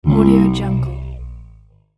dinosaur herbivore groan

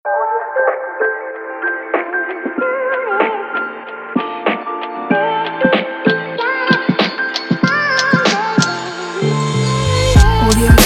summer hiphop rb

